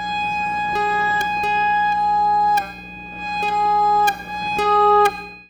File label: Trade Sustain, guitar